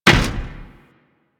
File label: Step Default, Footsteps; Warframe